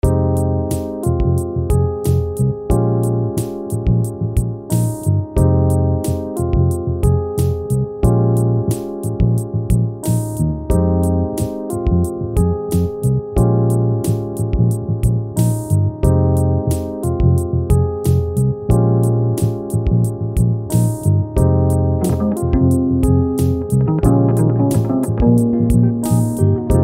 minor funk

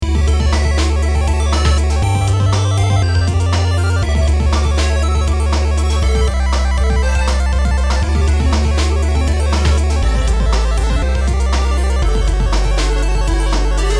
funky arps; weeklybeats-2016

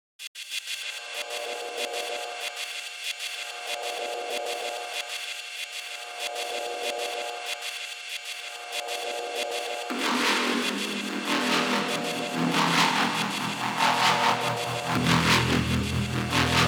neuroclunk 190bpm; weeklybeats-2016

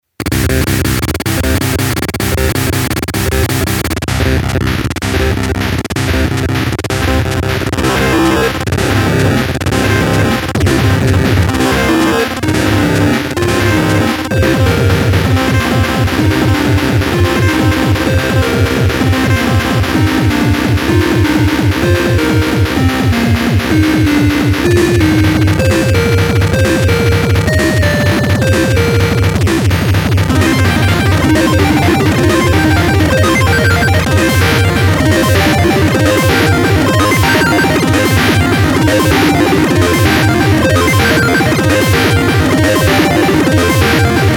horn; weeklybeats 2012

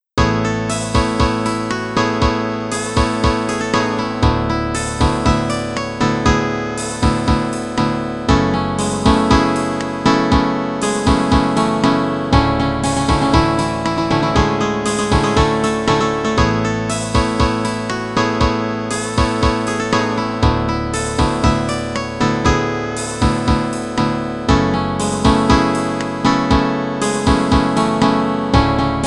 loop; weekly beats 2020

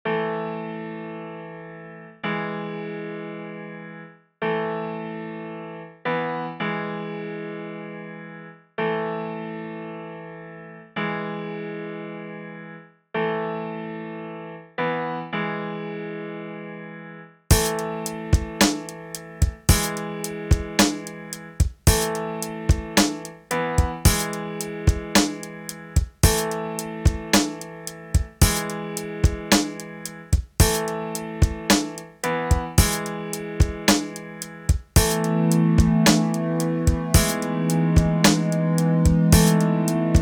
keys

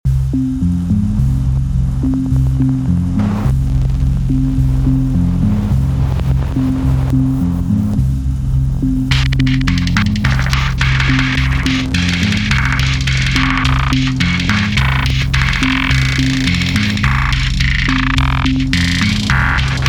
106bpm; undercurrents; weeklybeats 2026

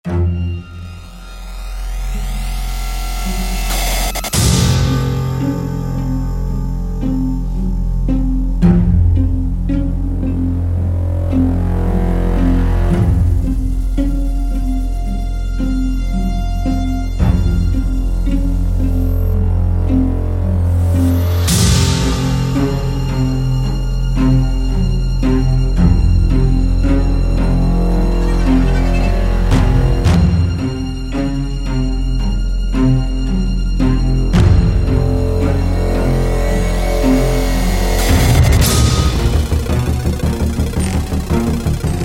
spooky menu music; weekly beats 2020

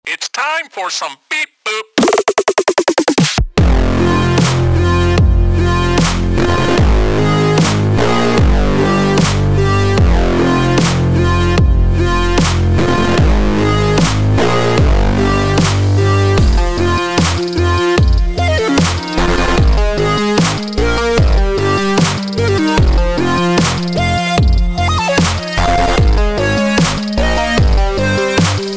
beep boop

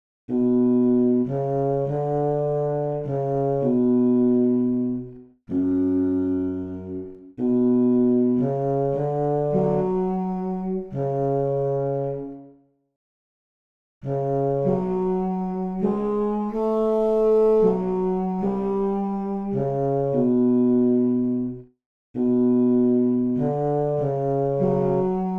bassoon tune; weeklybeats-2016

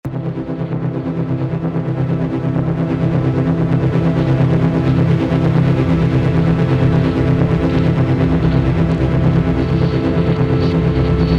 ambient; weeklybeats 2024

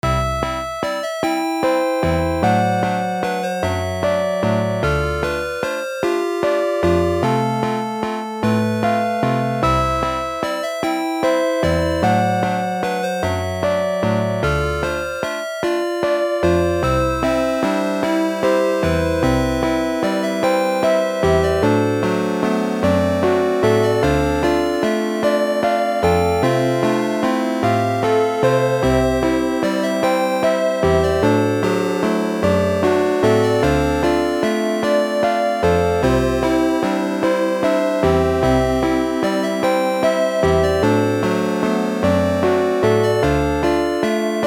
lullaby toy synths version

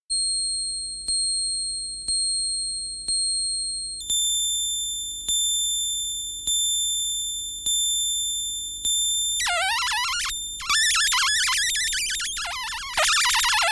pulse 125 only circuit bent gameboy noise; weeklybeats-2018

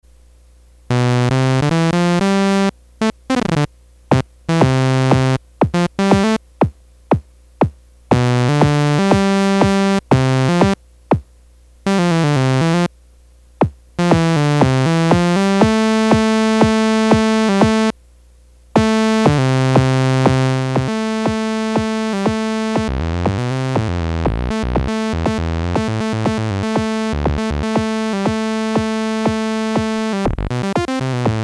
korg ds test; weeklybeats-2016

